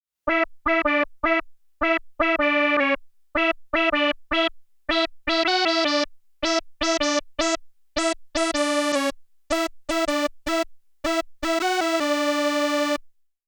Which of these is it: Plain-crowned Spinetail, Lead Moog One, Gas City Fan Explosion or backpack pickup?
Lead Moog One